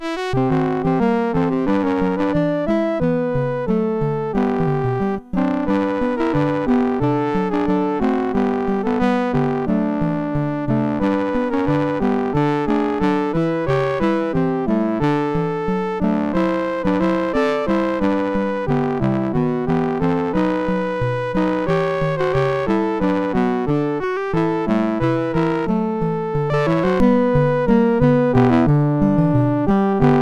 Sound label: MOS6581R2